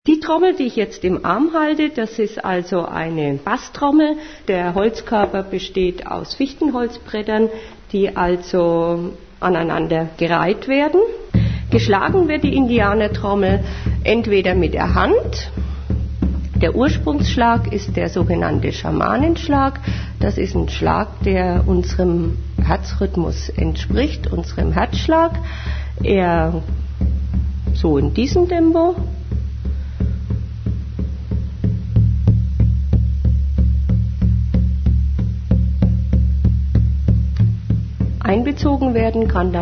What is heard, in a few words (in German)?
trommel